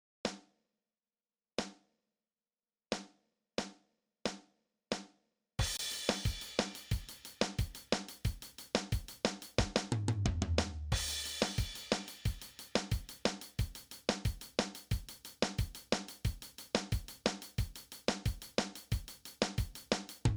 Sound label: Drumset